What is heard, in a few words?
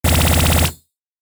consecutive impact metal; ボードゲームやカジノ、麻雀の効果音です